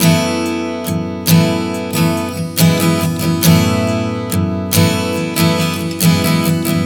Strum 070 F#m, One Chord Strumming 070 bpm; Sonic Foundry (Sony Creative Software); Songwriter Acoustic Guitar Companion

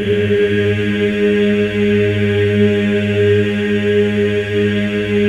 MEN G#3; MEN EES OCT; Spectrasonics; Symphony of Voices

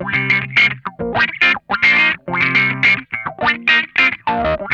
GRAINY WAH, Funk Guitar; Zero G - Funk Guitar

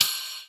Record Stopper (Cymbal 2); Sonic Foundry (Sony Creative Software) - Stylus Pressure Urban Grooves on Digital Wax

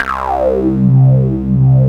poly rez C2; REZINOID; Synth

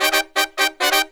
Pop & Funk Brass

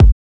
Kick, Kickdrum; Equipped Music; Loungin House

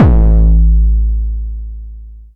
909 BD Long Tape Sat; Goldbaby Tape808 & Tape909; The Tape 909

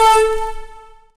ethno, sound ethno

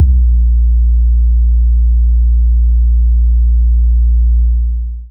DC Deep Bass, breakcore

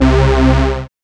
SYN SYN, dance synth; Sound & Vision - Gigapack I CD 2 (Roland)

